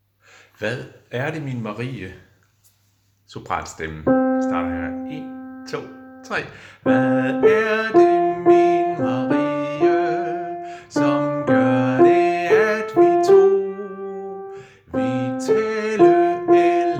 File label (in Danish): sopran, harlev koret